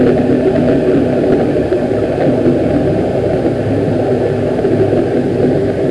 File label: water basin